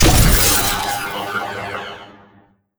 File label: player death; captainbigbutt; carbon miku